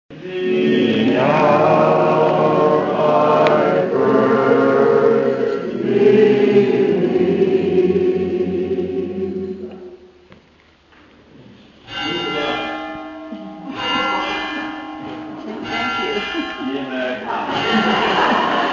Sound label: singing and bells; Krailshausen; church bells; Amazing Grace; germanytour